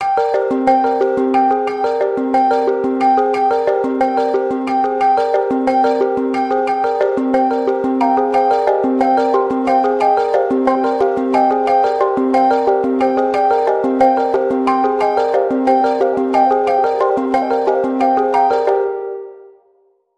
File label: Psychedelic Dream Pulse